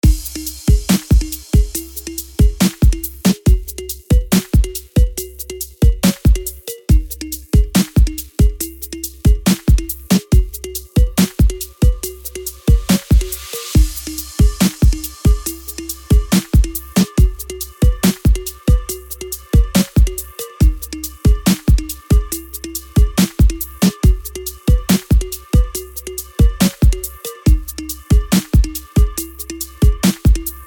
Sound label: song; vex3